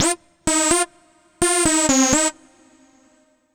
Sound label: Android Riff, Funk; Dr. Fink's Funk Factory